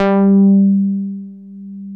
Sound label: MOOG1 BASS G3